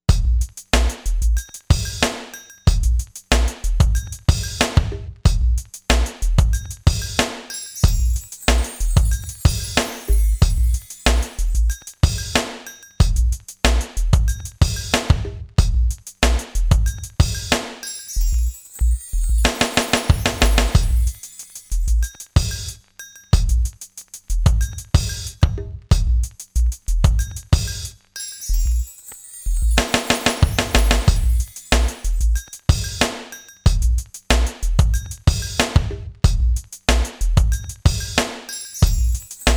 KICKIT; AKAI XR20; Factory patterns

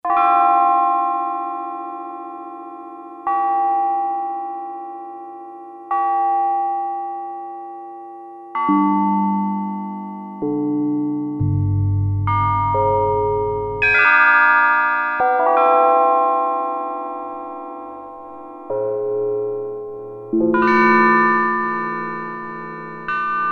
Clangorous Bells; Sequential Circuits Prophet-5 (Ver.3); Factory Patch

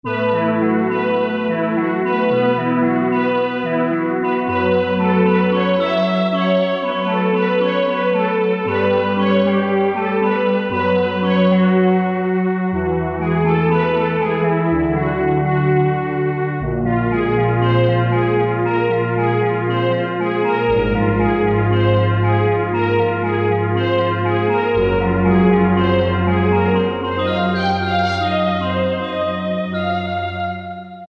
BELL PAD; Akai SG01v

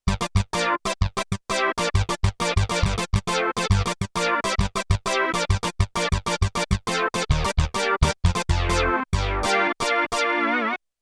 Unison VCF Brass, Prophet 5; Yamaha TX16W

